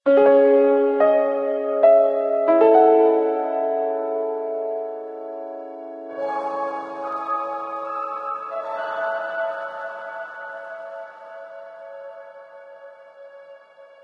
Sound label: Village Sting; ether one redux gamerip 2015